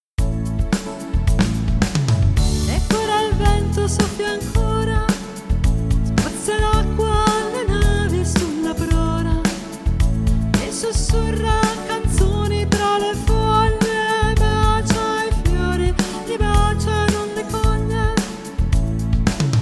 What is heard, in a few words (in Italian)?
soprano